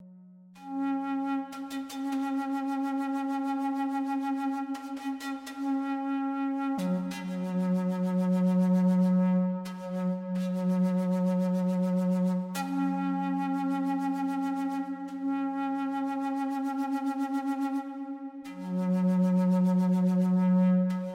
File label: native american flute